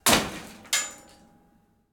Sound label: piano destroyed demolished broken wurlitzer upright hammers debris impact crash smash sled; sledge; fvtt sonniss gdc audio